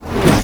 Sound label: Overhead Smash Attack Pre Hit; Nightwatch; Fortress